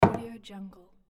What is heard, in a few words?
putting down a glass of water